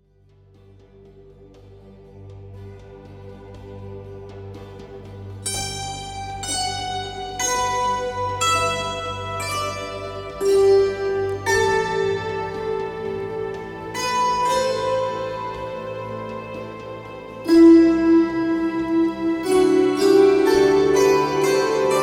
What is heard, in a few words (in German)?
harfenmusik; nach Camille Saint-Saens